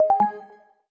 match confirm; Silver Horizons; seysant

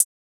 drum hit whistle; kodama - nogi; eri

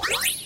menu hit; Moe[Sagi]ri; Moeriko

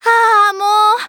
section fail; Rin Skin 2.0

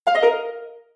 match join

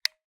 pause hover; Night05

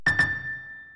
combo break; SPAZZAPENGUIN17+PUNPUN (ORANGE)

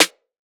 normal hit clap; kodama - nogi; eri

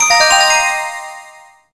match start; saiyku [rby] instafade

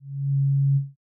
section fail

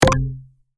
menu hit; summer pockets (pink)

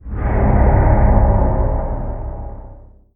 section fail; Sunset Horizons; seysant

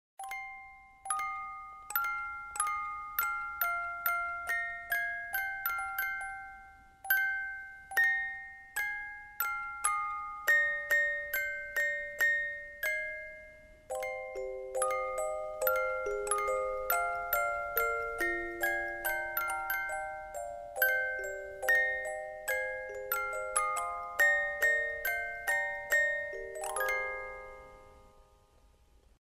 pause loop; Le Fishe au Chocolat

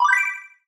spinner bonus; Obsidian

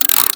slider bars; SPLOOSH ( + )

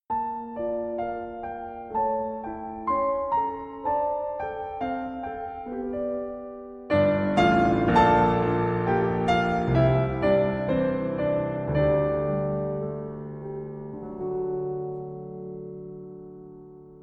pause loop; NekoRetro (SK)

